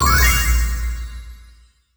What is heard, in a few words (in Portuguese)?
acerto; diogenes gaming mines